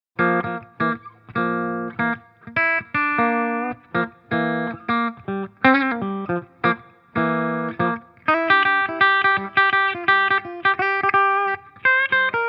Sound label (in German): AG75G Steg clean; Ibanez